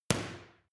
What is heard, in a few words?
boxing paw hit hard